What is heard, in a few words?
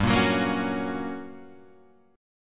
G Minor Major 7th Flat 5th, G, down, Guitar, Half Step Down